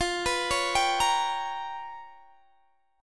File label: G 7th Sharp 9th Flat 5th